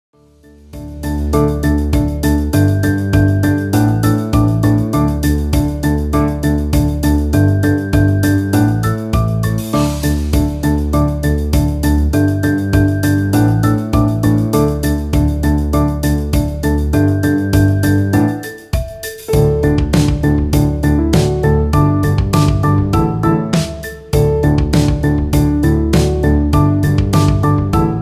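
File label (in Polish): mała wiewiórka instr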